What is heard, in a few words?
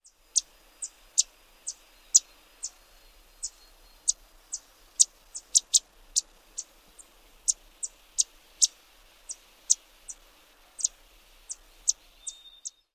alarm chips